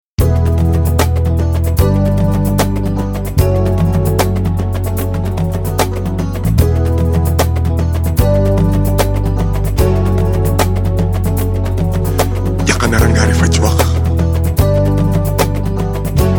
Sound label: mbalax